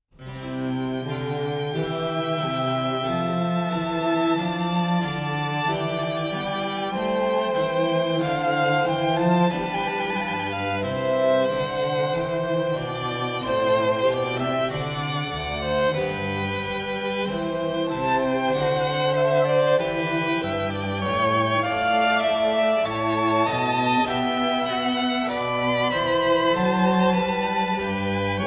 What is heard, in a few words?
Trio Sonata For 2 Violins And Basso Continuo No.3 in C major; Vivaldi